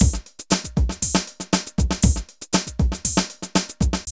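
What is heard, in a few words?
drum loops, left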